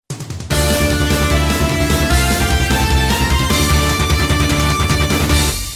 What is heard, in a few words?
Stage Clear